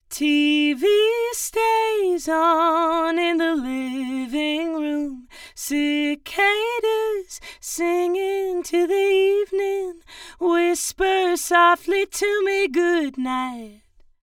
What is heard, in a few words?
vocal dual mono 3ms delay on right, Right side delayed by 3ms; Haas effect